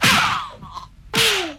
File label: spanking a guy